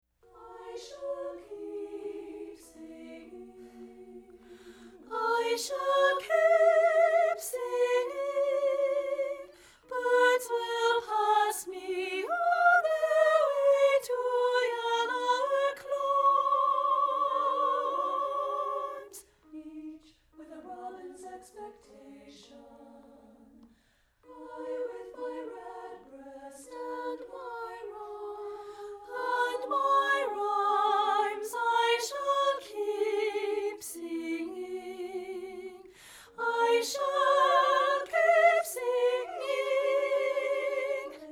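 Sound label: I Shall Keep Singing soprano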